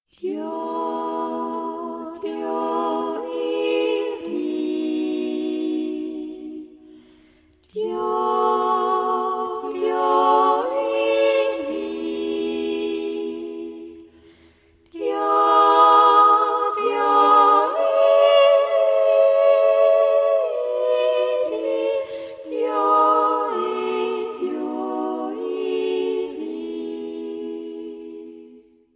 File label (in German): Andachtsjodler